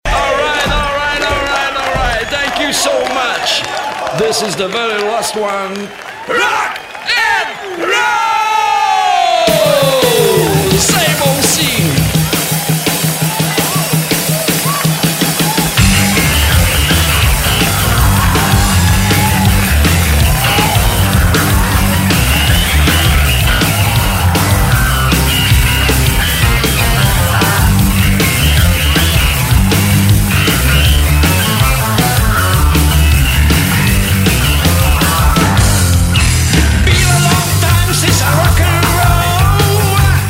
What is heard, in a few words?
Rock And Roll